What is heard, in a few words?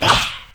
spectral basic attack; LoL; yorick